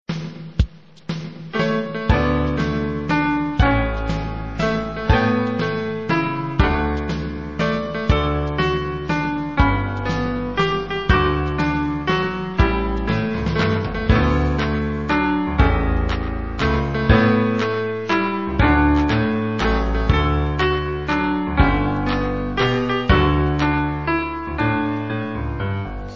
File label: Birthday Ringtone